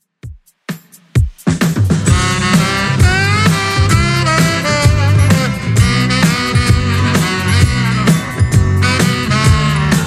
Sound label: 70s 130 bpm; Redrum